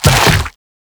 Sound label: Proto Infested Mutalist Speaker End Flesh; Warframe; Abilities